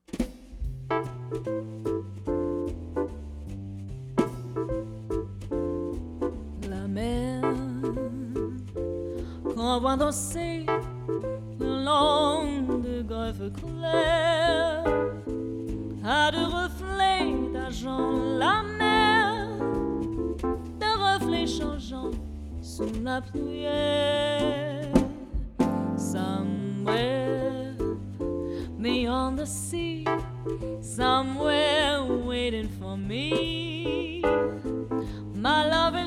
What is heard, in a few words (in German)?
Loungeband Live Piano Drums Gesang Band; Hochzeit; Hannover